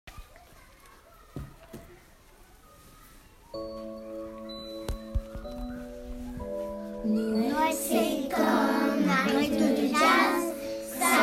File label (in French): Noel jazz